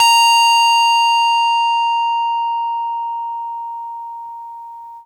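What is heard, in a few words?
SCARY RHO; RHODES FUZZ; E-MU Producer Series Vol. 8 – Platinum Phatt; EP FX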